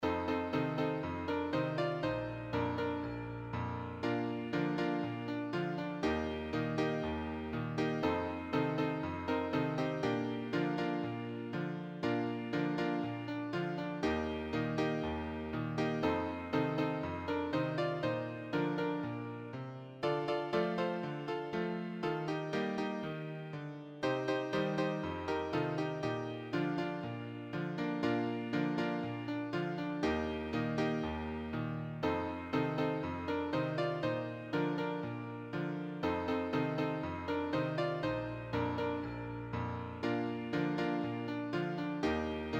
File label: piano acc; church and sunday school music; Hymns